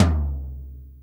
LOW DEEPTO, ACSTC DRUMS; Pro-Rec - Synthsonic

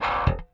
Rickenbacker Bass; Airwindows; Rick-Xtras

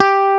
BASS G-3; WORS STATION; ROCK

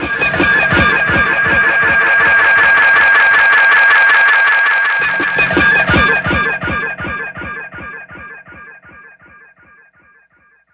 Electronic Pad; Sonic Foundry (Sony Creative Software); Electronic Point Blank

